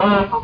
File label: Peacock honk